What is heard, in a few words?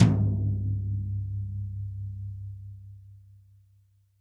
TOM, REAL DRUMS, SWING KIT